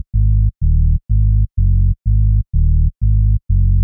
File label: Bass, techhouse